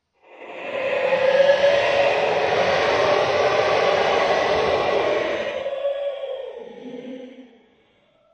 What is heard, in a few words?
augh